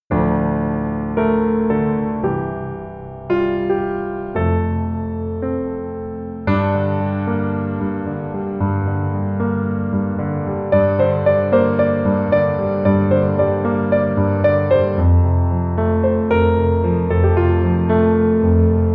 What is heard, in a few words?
Instrumental